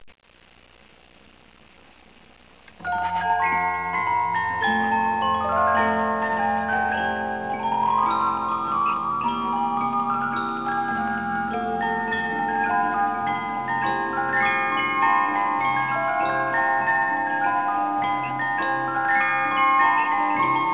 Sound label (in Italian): Mandolinata